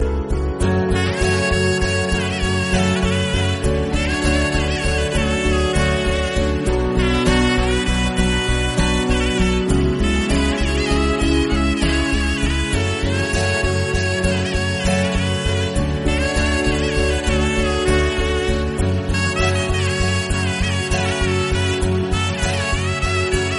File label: Telugu Song Instrumental; Leader